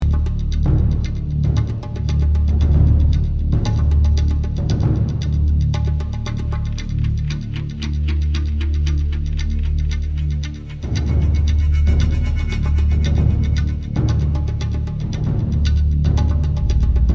Sniper Loop; Medal of Honor Warfighter; Sniper Loop Only For Respawn

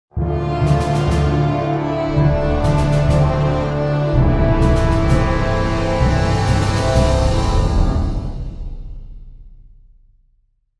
Lose Battle; Haven; heroes of might and magic online 2010 pc

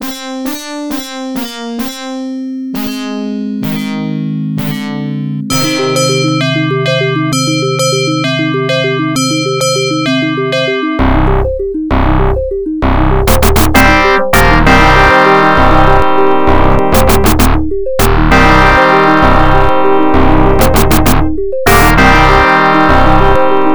Death; Rings of Power; Genesis

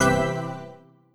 New Goal; American Idol; iOS gamerip 2009

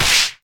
Disarm Hit; boomerang fu

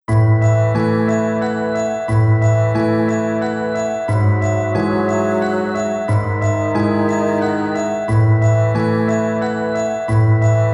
Piano Ver.; Sakura Strasse: A Love Story in Sakura Street

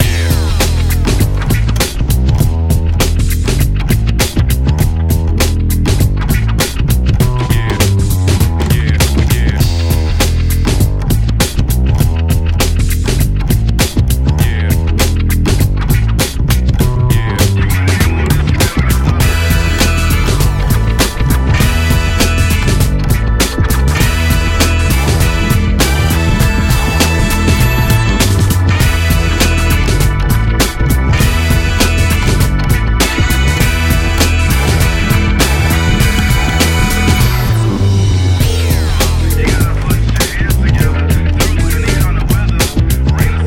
race; D1 Professional Drift Grand Prix

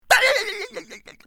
Pig hit; bad piggies; android; mobile; windows

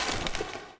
player get Medkit

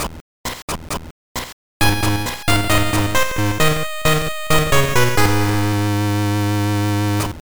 Level Completed

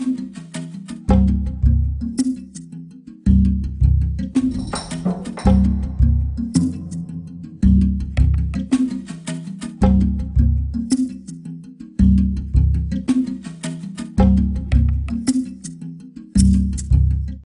Jungle Level

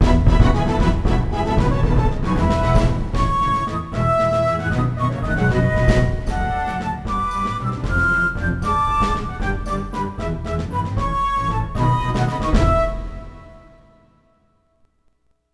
Duel Music Win

